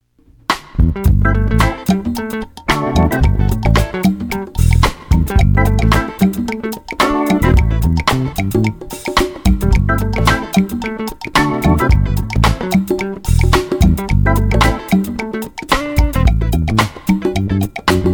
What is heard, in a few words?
African Band